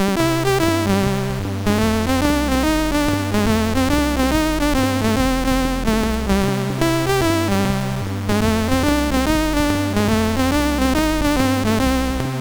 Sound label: BASIC, MOS6581R3; Soundcontrol 2 Demo